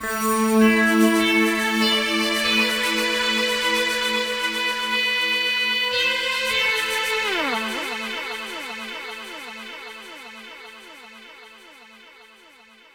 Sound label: Synth